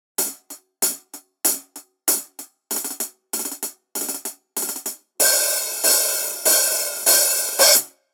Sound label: JazzMaster Hihat 13